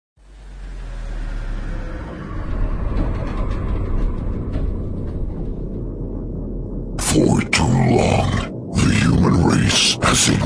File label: dubstep mix; shambhala 2010